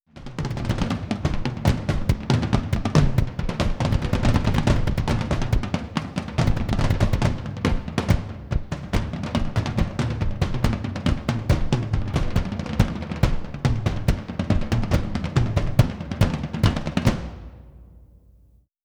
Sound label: Drums Percussion